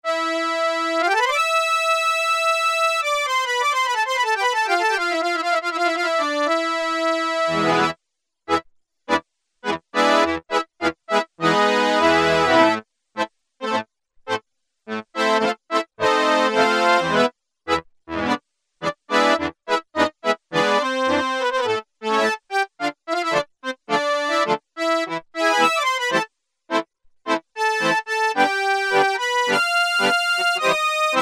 Accordion, Roland Juno-106; Factory Preset

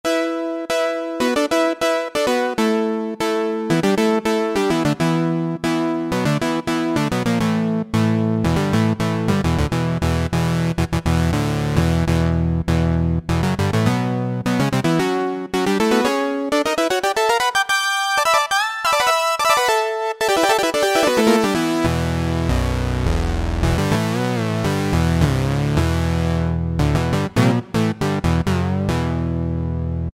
Lead; Vintage Synthesizers And Classic Beatboxes

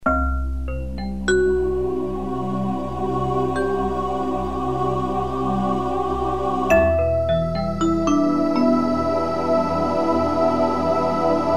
Keyboard

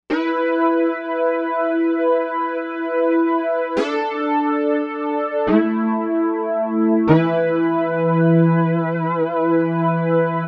Novation UltraNova; Factory Patches

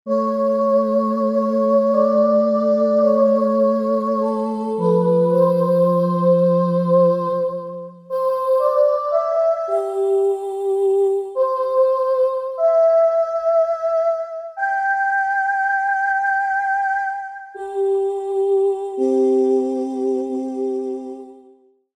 Voice Sing; Korg MSC-1S Variety

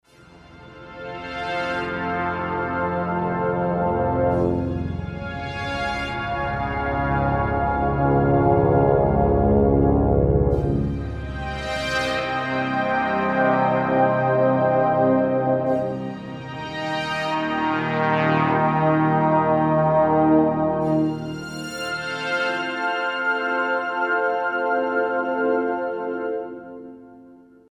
Slow Big Brass; Digital Synthesizer

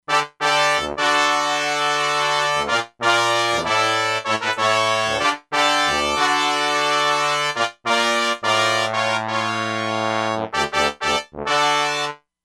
Bright Brass, E-mu Proteus-2; Factory Presets

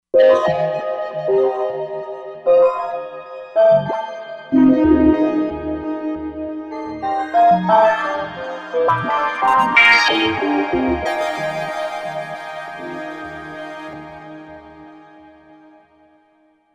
Celebrated, Roland Fantom XR

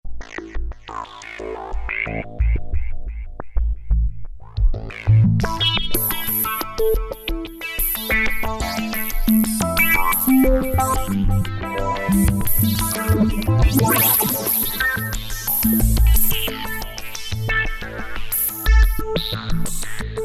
System-700 S&H, Roland JD-990; Vintage Board

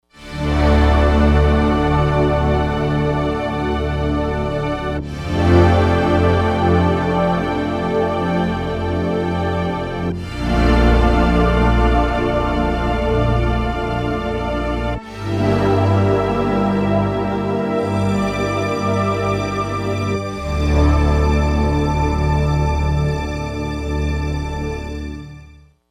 Pad Octave; Digital Synthesizer

